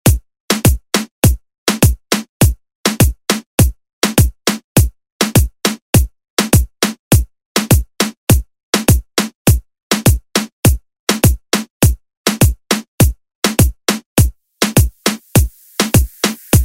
Latin Urban Redrum; Deejay Hit

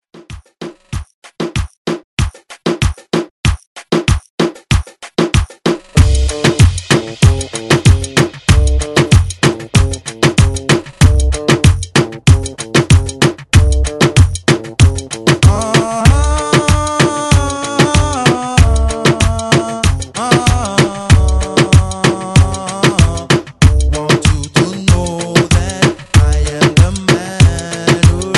90s ReDrum 95 Bpm; DJDOLLS

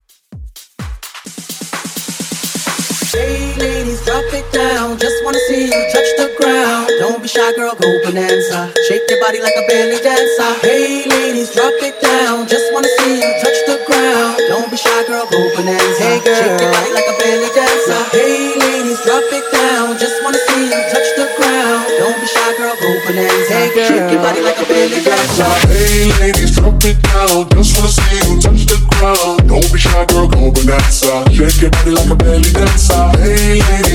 Mashup House 128 BPM

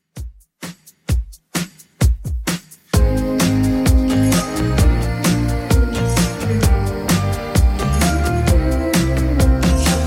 Top40 Redrum 130bpm

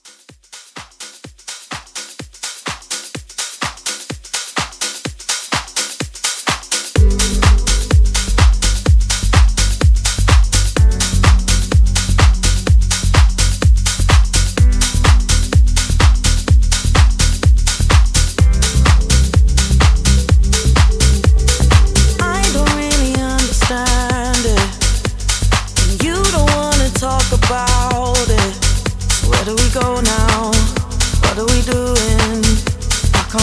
House Re Drum

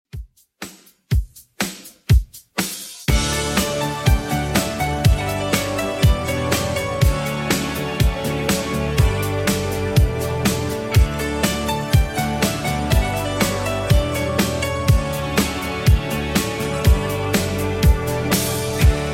80s Redrum 122bpm

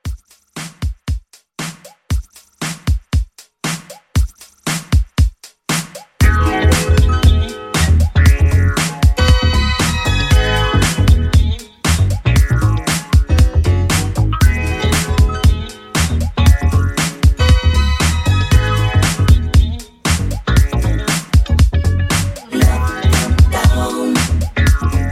80s Funk Redrum 117

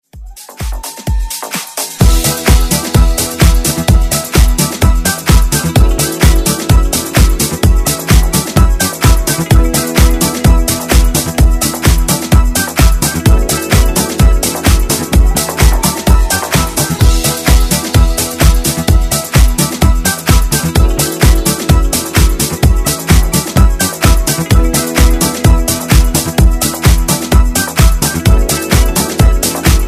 Retro Dance 80s Redrum

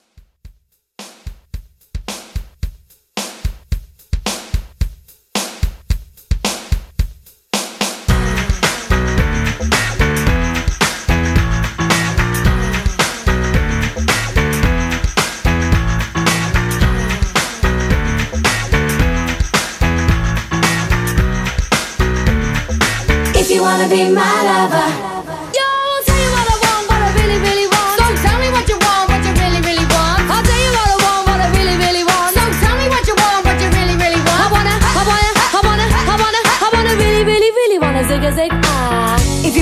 90s Pop Redrum 110 BPM

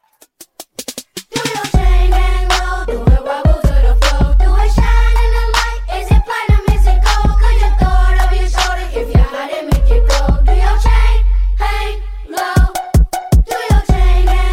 Dj Intro Outro 79 Bpm

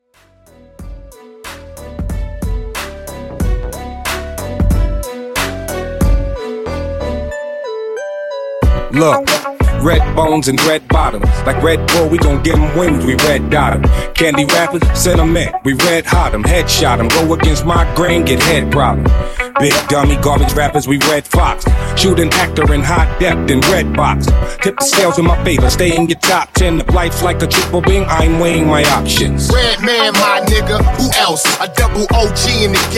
HipHop 92 Bpm Dirty

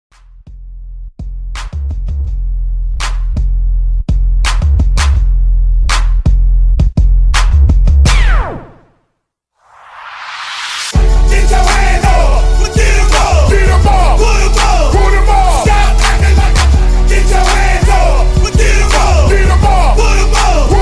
Teen Pop Hype Funky 83BPM

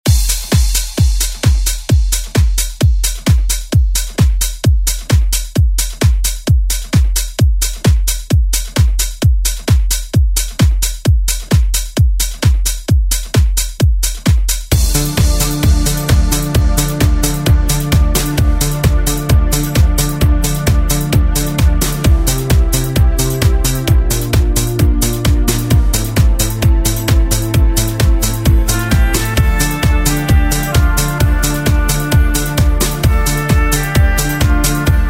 80s Re Drum

